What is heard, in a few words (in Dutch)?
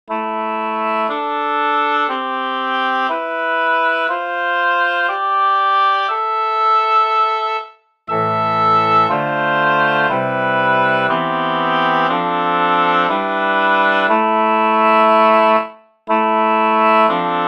Modale akkoorden